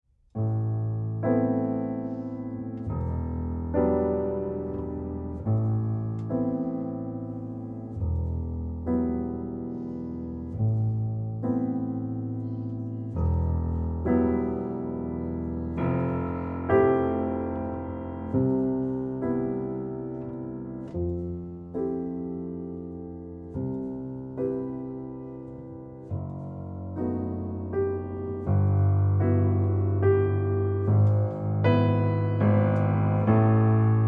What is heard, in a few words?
La Valse Bleu piano